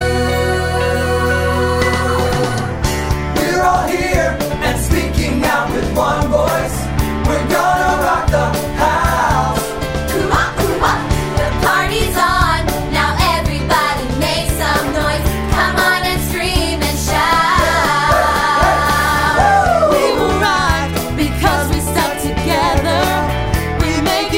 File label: Were All Vocal